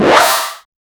dash in game

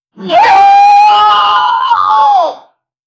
pirates wincing and going, wince

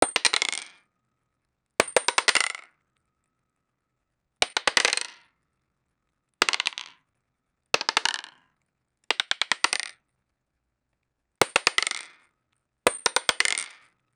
futuristic dice roll, board game; sound effect

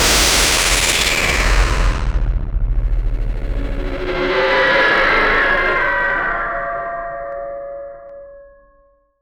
powerful dark curse sound; explosive

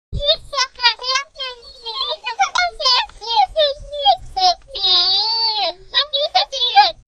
a surprised and amazed, amazement

